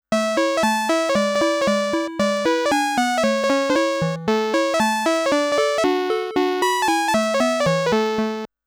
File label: High Score MUSIC; Namco Classic Collection Vol.2; arcade soundtrack